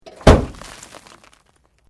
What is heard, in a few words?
Metal Light Movement Minor; Sound Effects:Materials